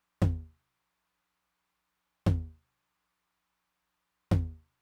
mid tom with, Roland TR-909; drum machine; Roland TR-909 Ableton Project

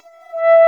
E3 METAL SY, XIANG REEDS; NorthStar - The Wizard VOL-2

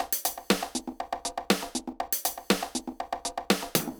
Beat Perc Mix, 120bpm, Old Skool House; Construction Kits; Big Fish Audio; OSH House of Amnesia

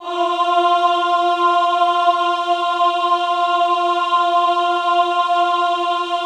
AHH F3, Extended Classical Choir; Best Service; AHH MIX

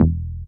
DR. LOOP, BASS; X-Static Goldmine 3

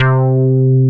Dance Bass C4; Digital Sound Factory Xtreme Lead

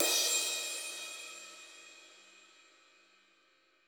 CYM; Bob Clearmountain Drums I

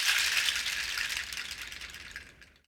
Equant nutshells Loop Release, shakers

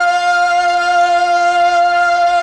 str f4, String, MELLOTRON; Retro Sampler; Brass + String

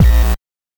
BANK1 BD, NES DPCM; MIDINES

